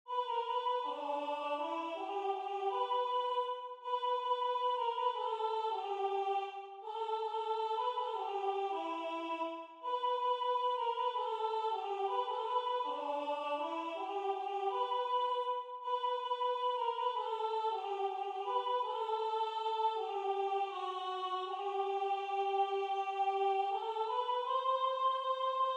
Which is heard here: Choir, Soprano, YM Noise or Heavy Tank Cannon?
Soprano